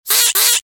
ducky monster

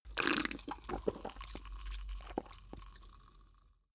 drink soda can small slurp small

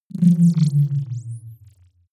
wobble drop splutter low

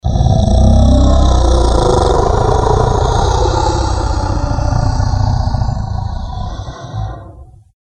danger growl

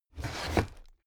drawer cabinet crammed open heavy halfway

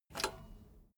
bmx bike pedal foot release soft

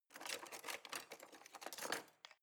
knives wood drawer grab soft

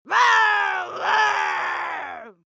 witch yell angry

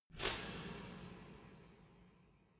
resonator hit single hard

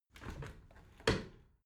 window open tilt

